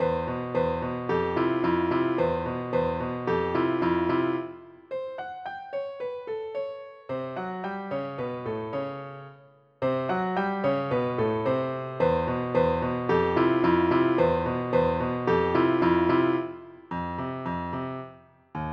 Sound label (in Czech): polka